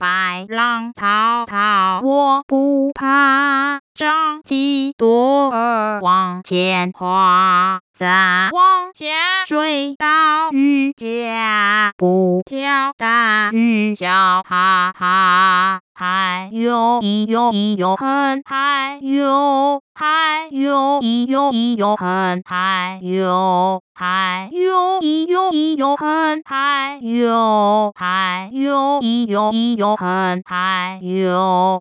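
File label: Fishing Song, Mandarin Singing Voice Synthesis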